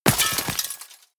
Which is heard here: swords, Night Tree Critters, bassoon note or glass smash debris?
glass smash debris